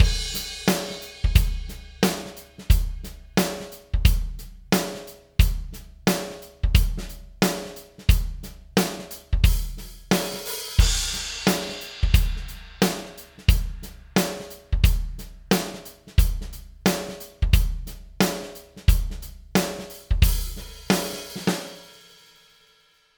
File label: drums dry; chameleon labs 7602 mkii